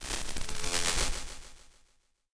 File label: idle electricity; smite; god merlin